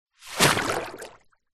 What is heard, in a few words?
foley plastic bag bin liner empty submerge in deep water bubbles